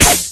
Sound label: medic syringe overdose, weapons; tf